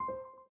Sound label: Zero Particle Lock; Samsung Galaxy S7